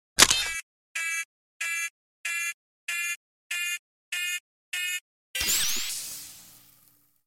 overheat; gmod; lvs star wars

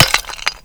brick impact bullet; tfa starwars melee weapons pack; nmrihimpact

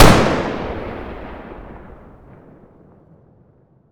fire dist 45acp pistol ext; gmod; arccw uc commons attachments

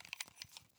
movement pistol; gmod; arccw uc commons attachments

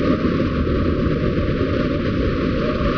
wind loop; gmod; lvs framework; physics

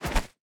punch miss; gmod; arccw uc commons attachments

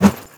spade light; melee weapons; tfa starwars melee weapons pack

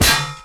hit receive; gmod; lvs framework

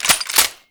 pump, armas disparos; gmod; arccw kraken s republic essentials content

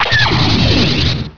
tie shoot, weapons; gmod; star wars vehicles episode 1

